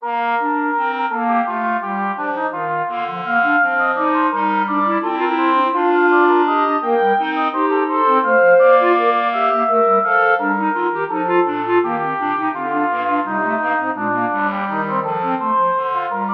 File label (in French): bois; bach johann sebastian